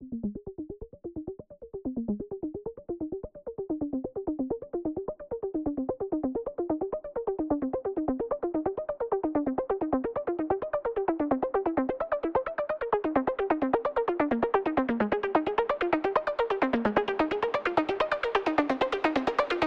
Arp (Bridge)

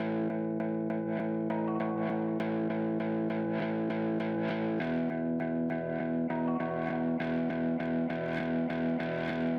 guitar; Multitrack

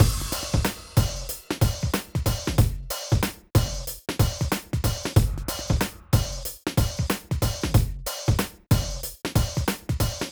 Drums

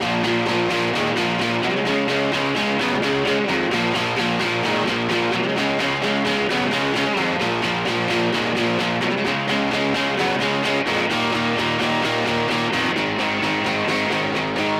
Rhythm Guitar; Guitars

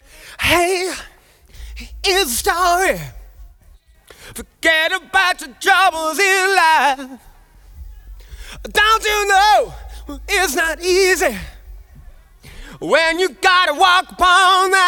Verse Vocal; Multitrack; Remix Parts

